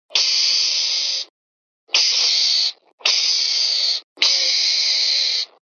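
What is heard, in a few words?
mangust, mongoose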